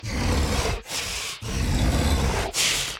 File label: Walk Cycle Burns; The Town